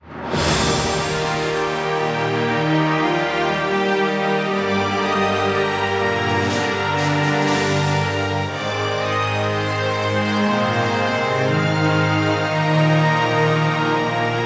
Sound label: Honor And Victory - Mission Complete